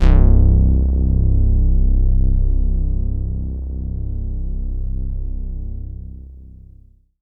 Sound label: BASS